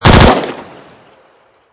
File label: famas